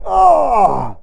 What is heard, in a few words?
hurt; cof; purnell